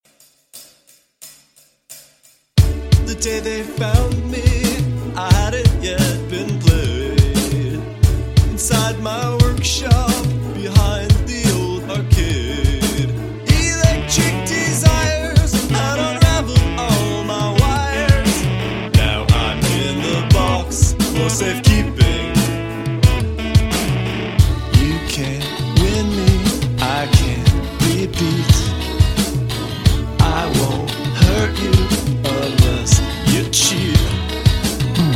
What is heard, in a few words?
With Vocals